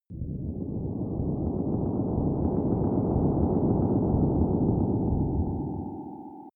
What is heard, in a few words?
far jet engine